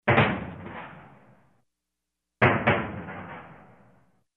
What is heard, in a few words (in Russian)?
vystrel orudija